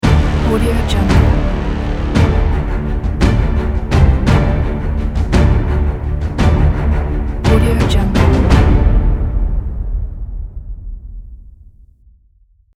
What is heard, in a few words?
the war logo